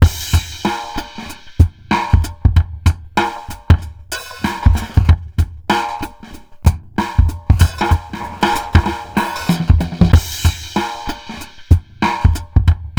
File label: ROCK; INIS; Funky Xtreams